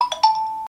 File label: xiaomi notification xylophone ringtone